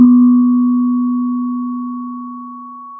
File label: Sound of a church bell